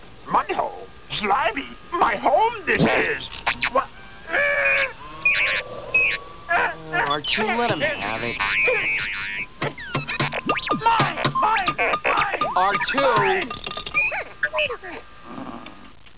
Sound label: MUD HOLE